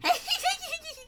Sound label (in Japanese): 笑い